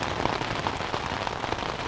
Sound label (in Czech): deštník; Mafie 1